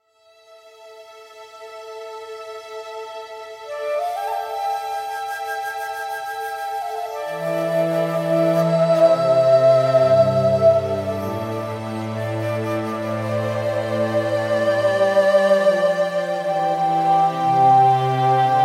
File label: music ambient